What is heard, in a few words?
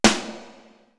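snare drum; East West; Global Sounds; RY30 DRUMS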